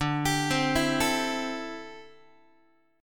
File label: D 9th Suspended 4th D down Guitar; arpeggiated; JGuitar